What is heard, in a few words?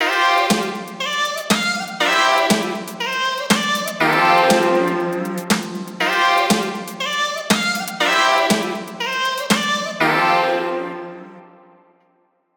Tone